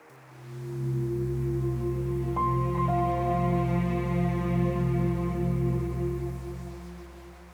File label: Haze - Contemplative, Pads; BB 2HTC Samples Vol 4